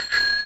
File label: Bicycle bell; mother2